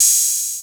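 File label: open hat, 808; musicradar-synth-samples; Classic drum machines; 808HATS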